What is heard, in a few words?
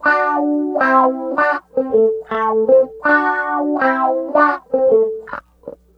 Guitar Loop; Ambient Grooves